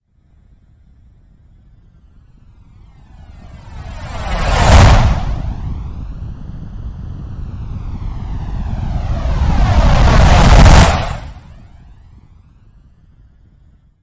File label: Jet